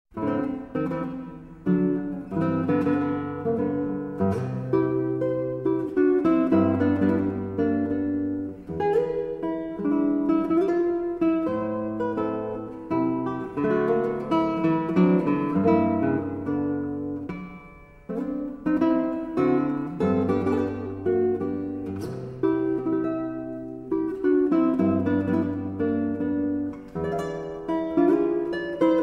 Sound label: Mazurka, Guitar